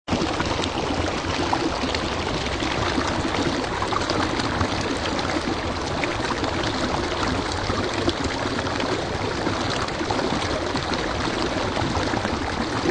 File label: Deep Mountain Stream, High quality upclose recording of a deeper mountain stream; Rivers; Nature